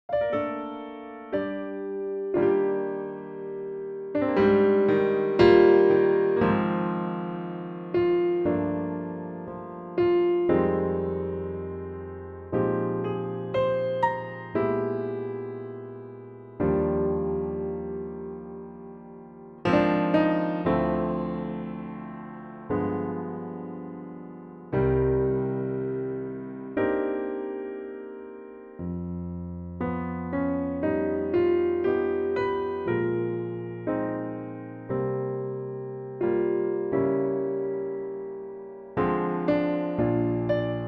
piano Eb E; key - Eb to E; Beautiful piano only arrangement, keeping more to an 8s feel rather than 4's

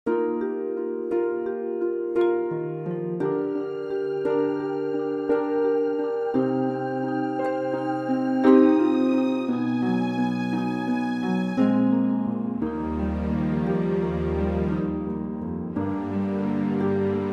Eb orchestral; 9/8; key - Eb - vocal range - G to Ab, optional top note Bb; Really stunning arrangement of the classic big song from the musical "Man From La M

